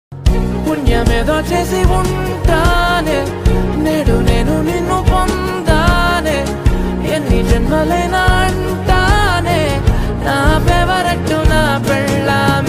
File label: song music love telugu